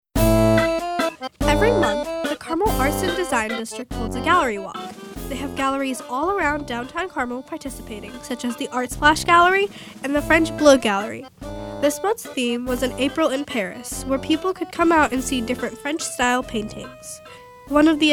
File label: BEAT